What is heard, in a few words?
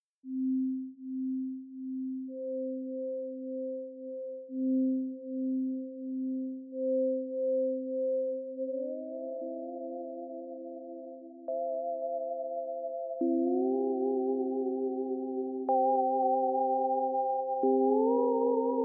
theremin